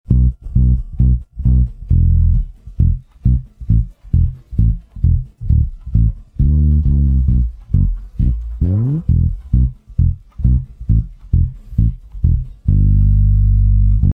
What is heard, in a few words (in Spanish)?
BAJO; multitracks